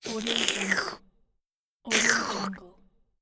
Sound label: the licking